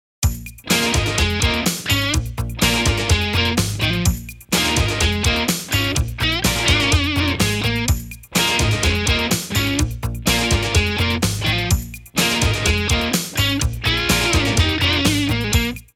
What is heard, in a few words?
DRIVE